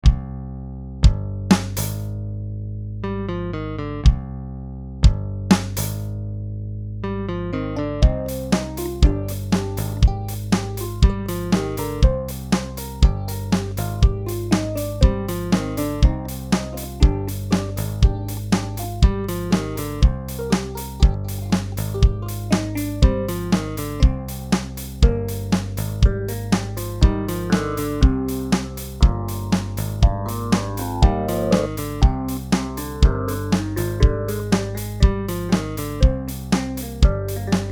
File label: clav song